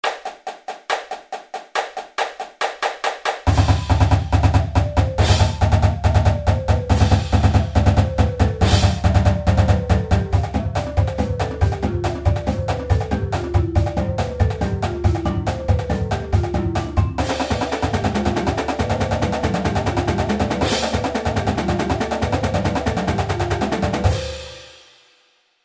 Triple Beat 140 bpm

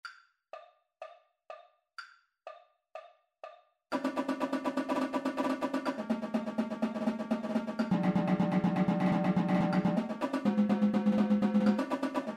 124 bpm Tenor

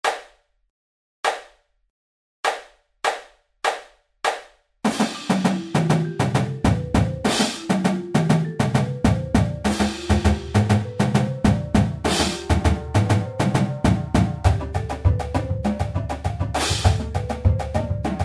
Double Beat 100 bpm

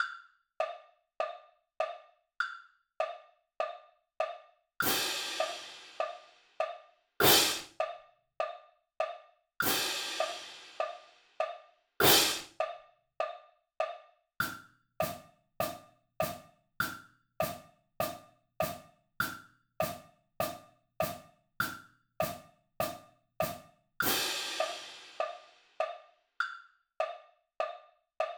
Triple Beat 100 bpm Cymbals

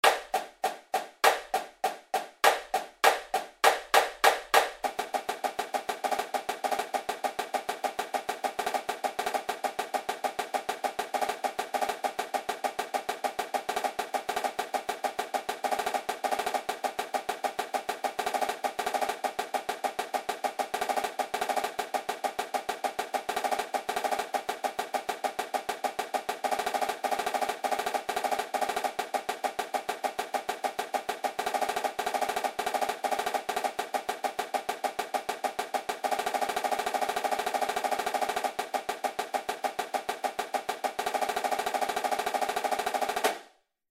100 bpm Snare

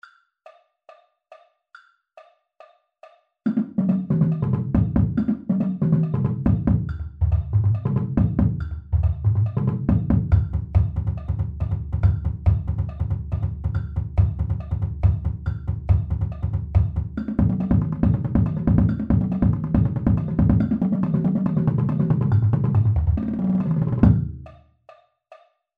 Double Beat 140 bpm Bass Drum

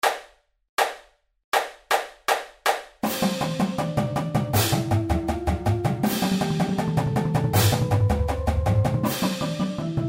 8 On A Hand 160 bpm

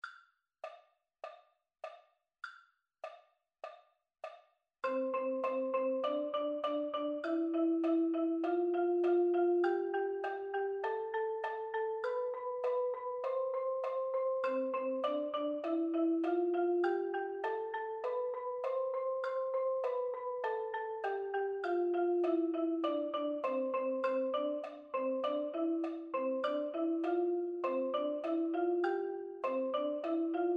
8 On A Hand 100 bpm Mallets

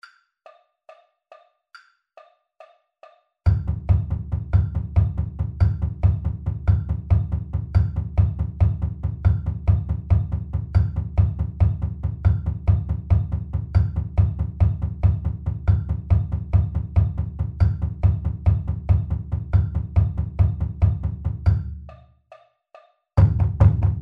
Accent Tap 140 bpm Bass Drum

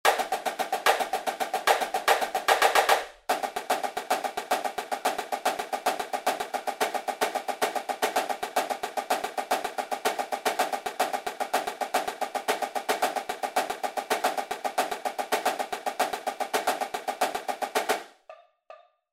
Triplet Grid 148 bpm Pattern Track

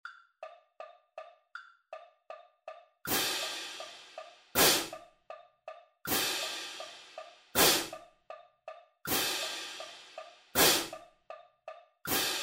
On A Hand 160 bpm Cymbal

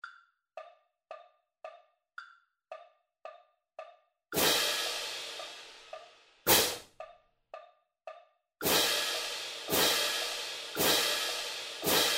112 bpm Cymbals

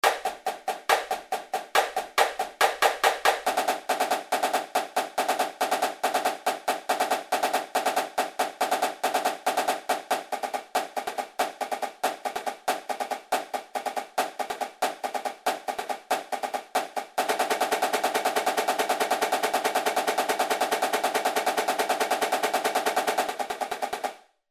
Triple Beat 140 bpm Snare

